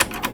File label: Foley